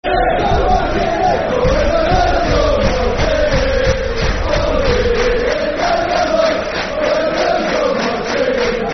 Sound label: Blues Go Marching In Football Chants (Soccer Songs), Cardiff City; fanchants-com